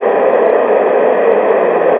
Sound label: noise calib stim